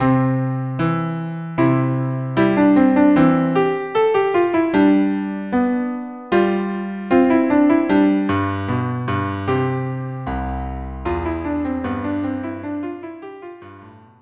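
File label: viola cello duo andante; KV 487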